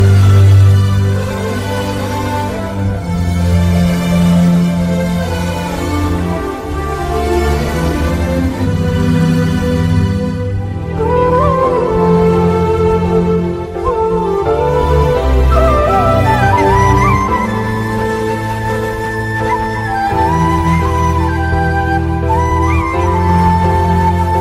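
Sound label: kannada music ringtone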